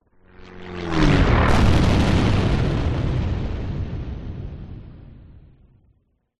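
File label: plane light prop crash explode exterior; vehicles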